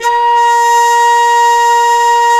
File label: WND FLT MT, Flute & Mute